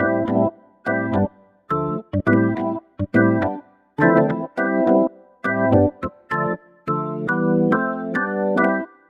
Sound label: Organ Laid Back C 105 bpm; Loops; Tonal; Keys; Ableton Live 12 Lite; Core Library